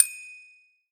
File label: deskbell; Scrat